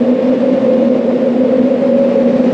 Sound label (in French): Tempete